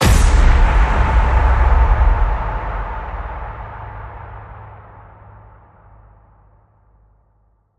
Hit Big Plate Tech Tonics

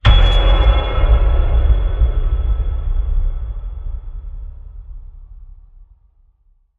Dark Piano Ping

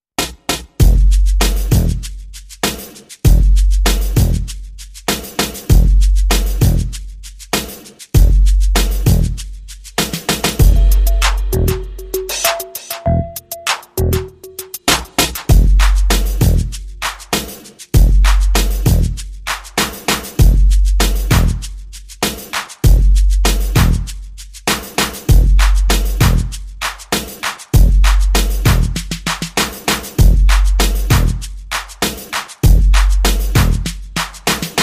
Drums and Bass Only

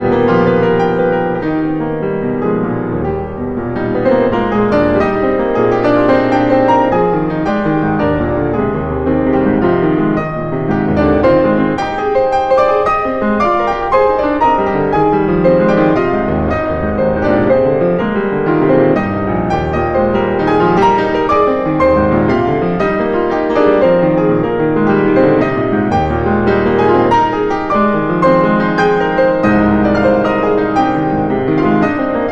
Nocturne

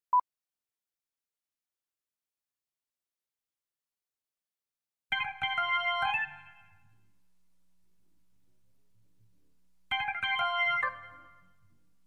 Solo Organ

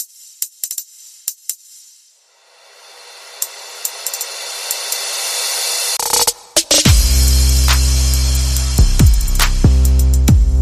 Bass Perc and FX Only

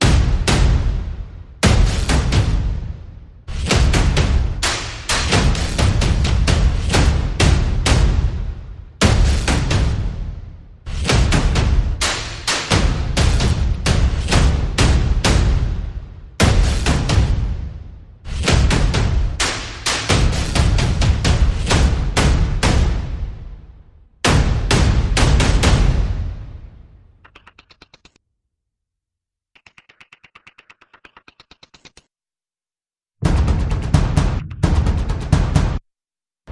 Percussion Only

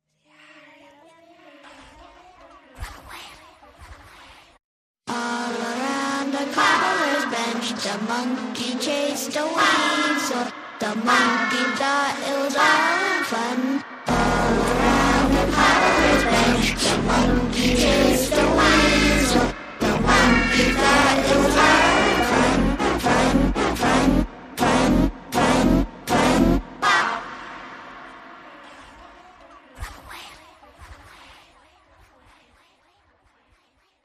Pop Goes The Weasel Horror, Vocals Only

